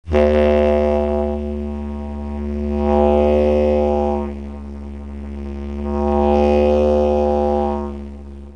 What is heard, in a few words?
vol mod, didgeridoo, varying the volume as you sustain a single tone; Try playing loud then soft and then loud again without causing a break in the tone.; Timeless Productions; didgeridoo tutorial